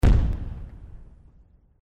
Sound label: explosion; Crash Bang Boom